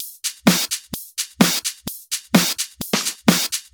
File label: Houseloops 128BPM; Vengeance Samples